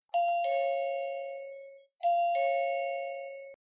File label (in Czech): melodie